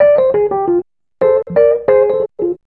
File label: ACID JAZZ